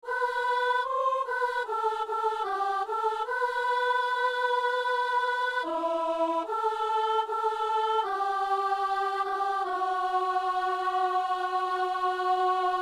par jésus christ ressuscité, soprano

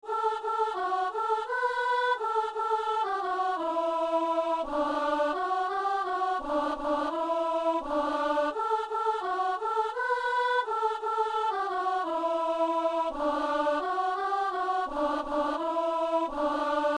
alleluia, soprano; Messe de Saint-Boniface

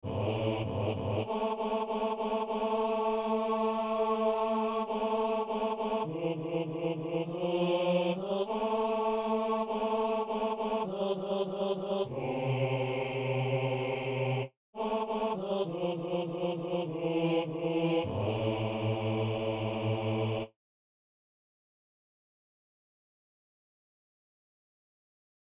basse; Saint-Denis; Louange eucharistique

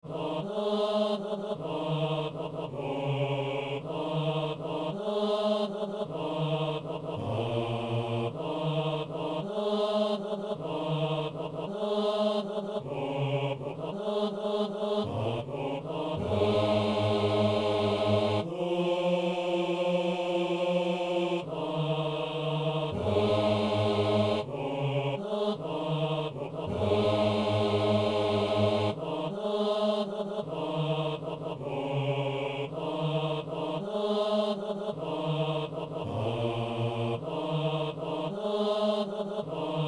hommes

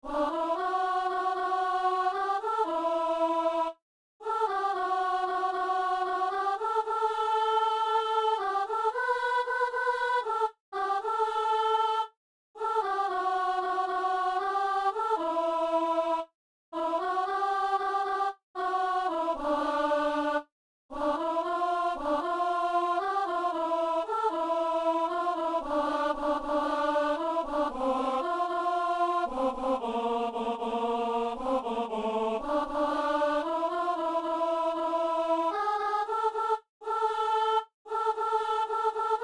alto chant

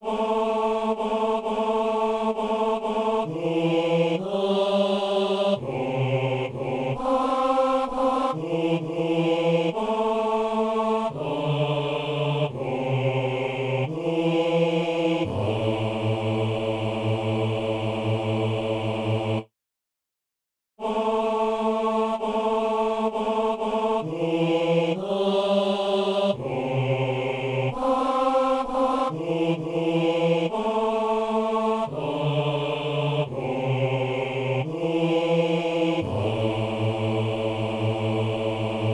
basse

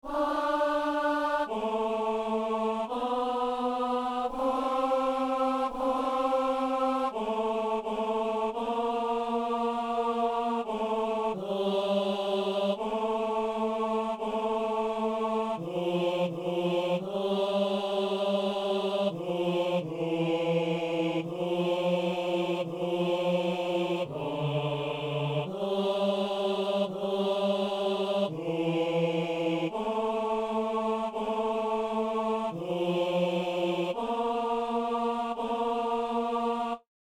hommes; O bone Jesu

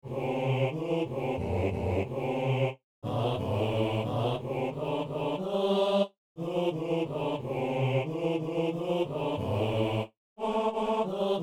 basse; Saint-Denis

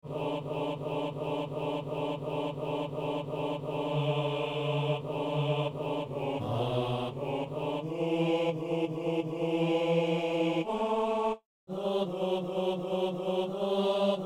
basse

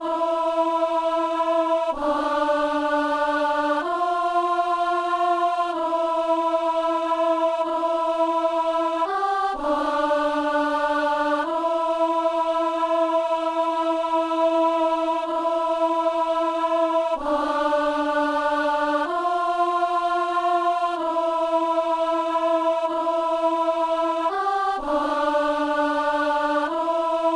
amen, soprano; Messe de Saint-François-Xavier - Amen

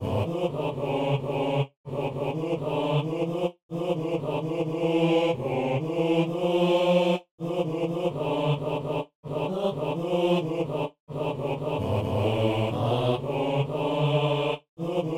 basse

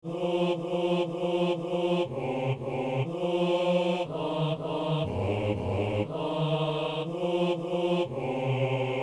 basse; Messe de l'Ermitage - Kyrie

